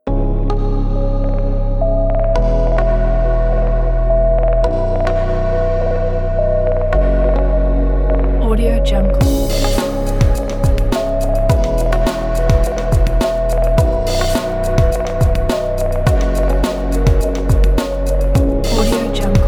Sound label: digital technology ambient